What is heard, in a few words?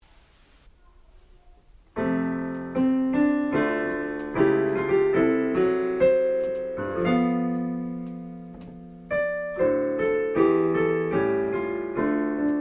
Soprano, Accompaniments